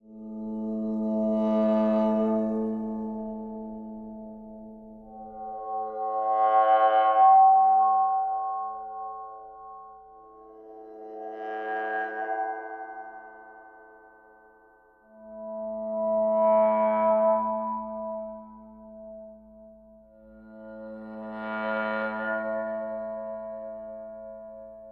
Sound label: Cymbal compare